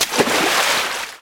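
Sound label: dive into the refreshing waves of the surf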